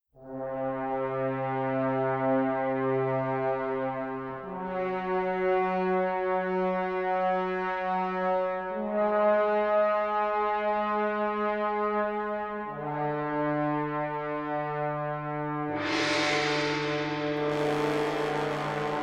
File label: Trumpets